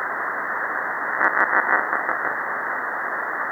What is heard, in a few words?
wumwum 80M